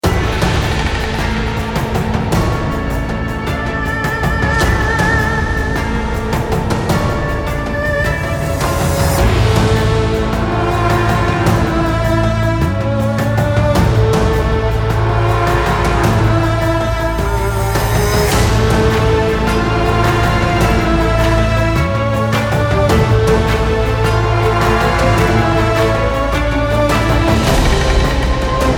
Battle Hybrid Orchestral; Mortal Kombat 11

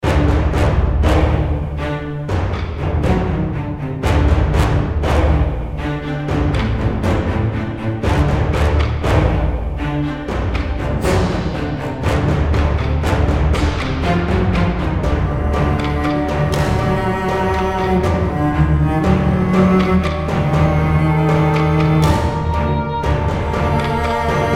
Battle Orchestral Elements

